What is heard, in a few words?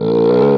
burp short